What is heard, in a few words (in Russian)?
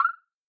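звонкий щелчок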